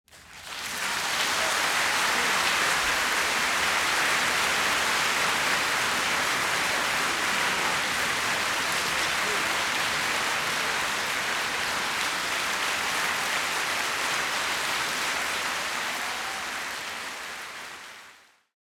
Applause - Large Crowd - Shorter Interior Music Hall Orchestra Some bravo; Hollywood Edge; sound designer's toolkit v2